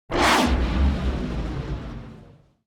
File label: whoosh space ship; NCH Library